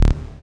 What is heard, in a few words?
SYN POLY, JD 800